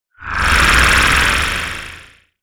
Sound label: Oscillating Sci-fi Transition